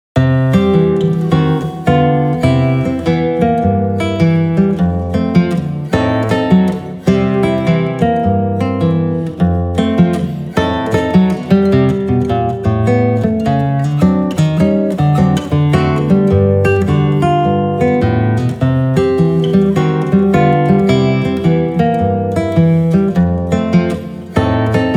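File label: Guitar; Saitenwege nach Nordamerika Band 1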